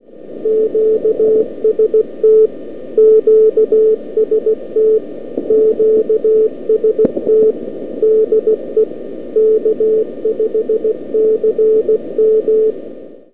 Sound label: 160, arrl fmt; arrl fmt nov2010